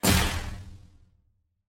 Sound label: Sci-Fi Gun Shot; SoundsCrate